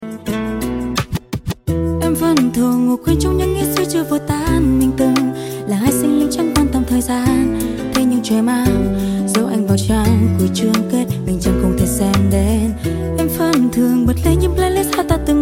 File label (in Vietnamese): giọng nữ